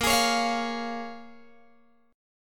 Bb Minor 7th Bb, Guitar, Open G

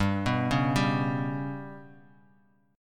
G Major Flat 5th Bass Standard, chord; GMb5 arpeggiated; JGuitar

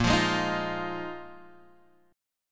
A sharp 7th Suspended 4th down, chord; strummed; JGuitar

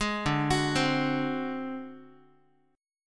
D 7th Flat 5th, Guitar; arpeggiated; 11,10,9,10,x,x; 4A,4E,5A,5D,5G#,6C#; chord

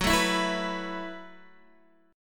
F sharp Minor 11th, Guitar, Open D

